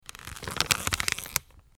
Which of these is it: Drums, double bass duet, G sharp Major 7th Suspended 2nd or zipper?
zipper